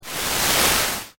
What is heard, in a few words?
SUCK UP